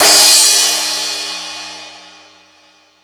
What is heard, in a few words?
Crash 2, drum machine; E-MU Pro-Cussion-1